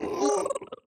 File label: happy, strider; mob; minecraft